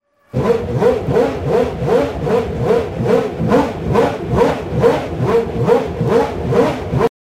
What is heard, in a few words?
Ferrari F1 V12